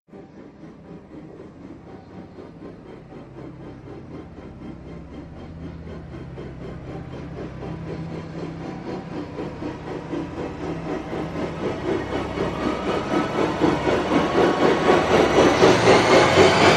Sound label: Rise